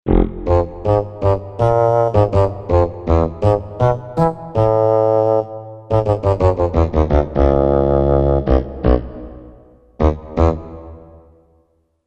Fagotto, Korg MSC-1S; Korg MSC-1S Variety

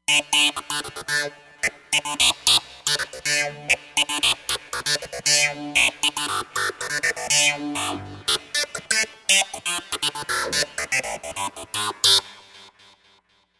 Steel Wire, Roland Fantom XR

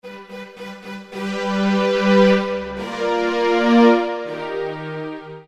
Crescendo Strings, Roland PN-D50-03

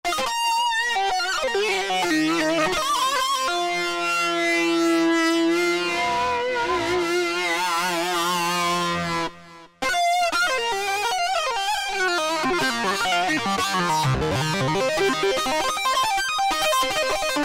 Lead, Korg Wavestation A-D

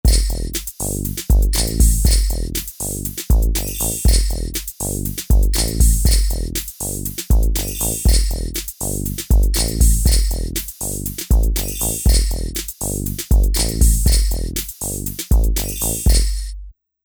Rap Hip Hop

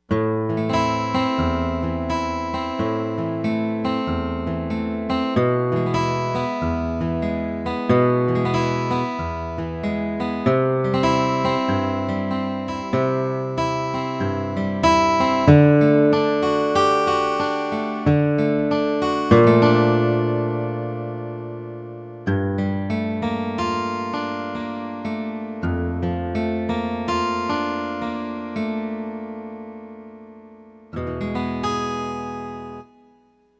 Acoustic Guitar

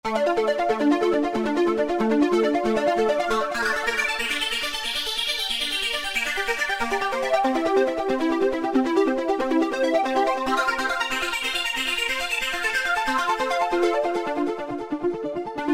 Korg microKORG Synth Harp; Factory Voices

